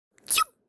Sneeze

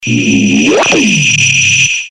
Static Sms

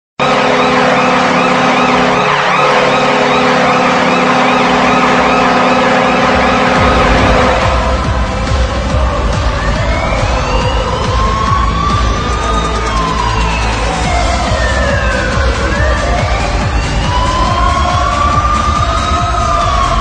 Goal Horn; Caps

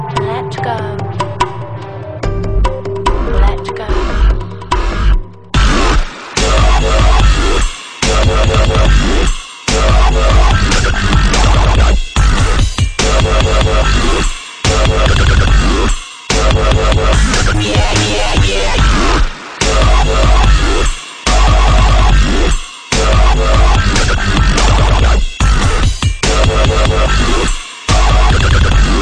Relaxing Dub

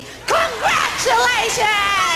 Congratulation Alert

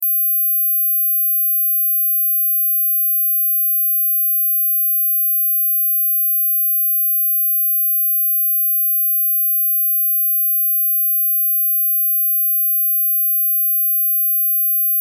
Destructor Sound Hz

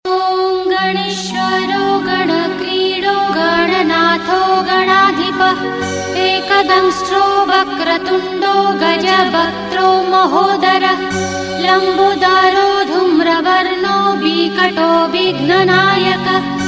Ganesha Mantra

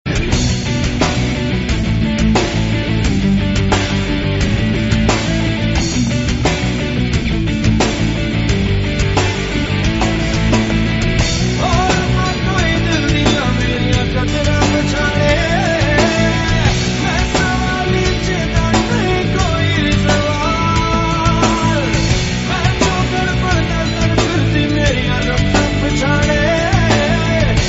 Guitar Riff

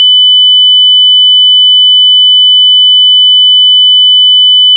Terrible Sound 3khz

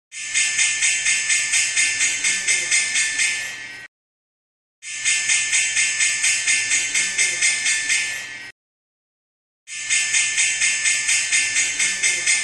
Bell Loop; Nyse

